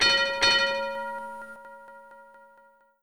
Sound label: bell, sea battle sounds